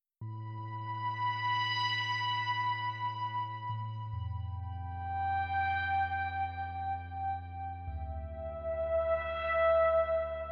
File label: Mystic Flute